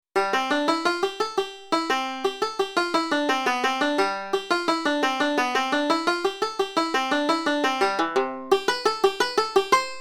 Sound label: Gigue